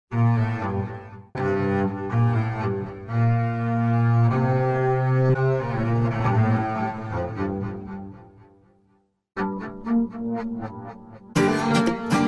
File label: cello session; new cello session demo